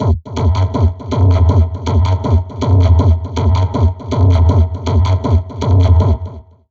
Drum Loops Crazy Processed; 160 CHAOT; USB Soundscan vol.07; AKAI

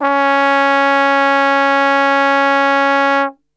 C#2 TRB STR; Brass Super Section; Best Service; TRMBSTRGHT; 90 s Sample CDs

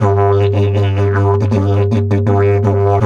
Didge 80 BPM, Didgeridoo; Big Fish Audio - Didgeridoo and Other Primitive Instruments

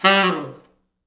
T Sax falls, Horns Sax; G2; East West; Quantum Leap Horns Sax

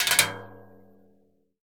CASCARA, Synclavier Percussion

